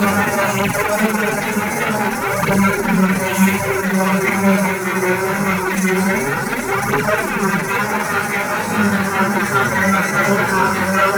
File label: Space Pod Forward Fast, Vehicles; Grineer